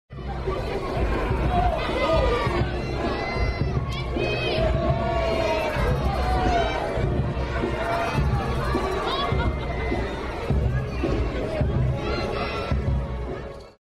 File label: Crowd ambience street party parade